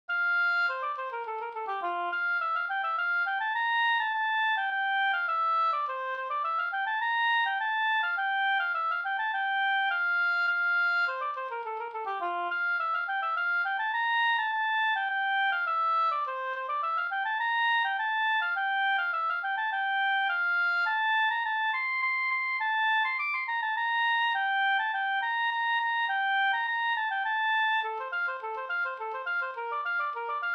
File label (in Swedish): slängpolska ringpolska